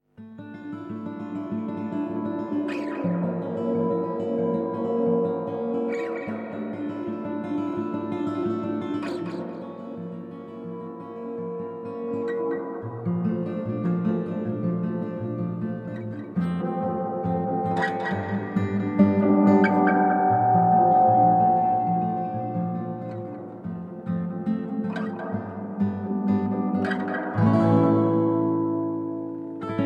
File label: Instrumental panic